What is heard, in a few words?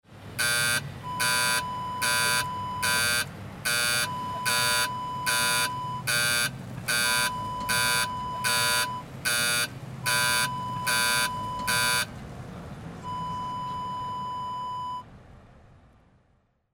caution car coming warning buzzer